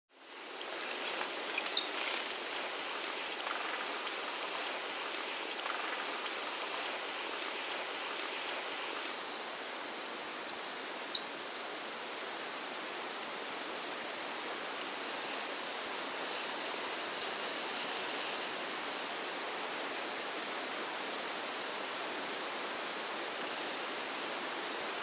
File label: White-Tailed Tyrannulet (Mecocerculus Poecilocercus), Andean Birds; S. America